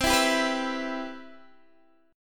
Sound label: E Minor C